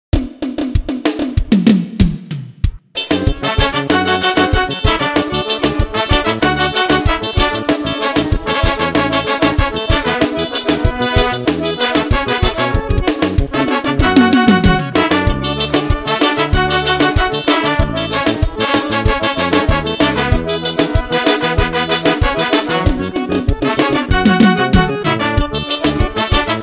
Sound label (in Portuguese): sampler ritmo; mh records